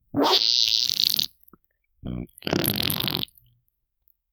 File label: bug vomit wet blaaarrgghh